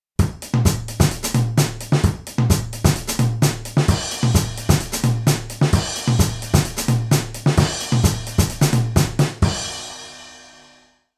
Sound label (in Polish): perkusja; Nagrania Lokomotywy